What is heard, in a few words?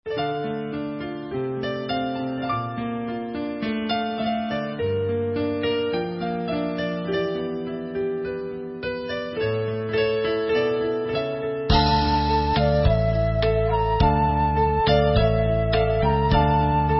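Instrumental, Israeli Dances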